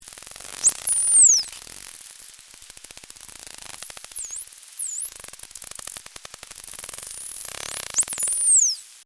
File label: Bottlenose, Voices in the sea